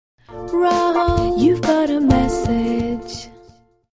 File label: sms